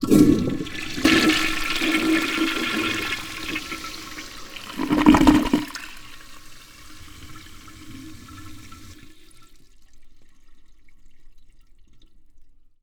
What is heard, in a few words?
Upstairs Toilet With H6E X-Y Microphones